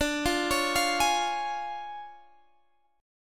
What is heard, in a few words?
D Minor Major 7th Flat 5th, D